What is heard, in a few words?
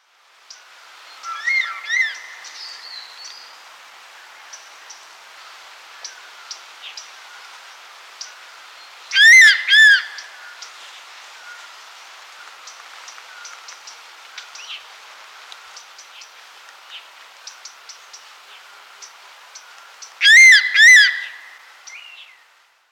crested serpent eagle